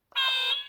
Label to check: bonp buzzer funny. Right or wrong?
Right